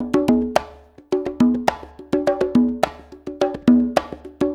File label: Congas, Perc Loops; Drum Loops; 4-4; Sonic Foundry; Drum Tools